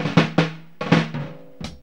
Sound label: FILL 84, Funky Elements LOOPS; Zero-G